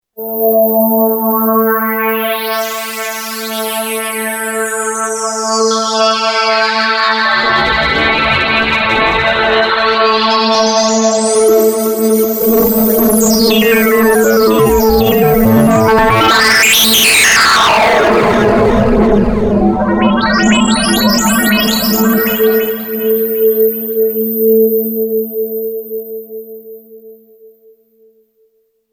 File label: Remix pad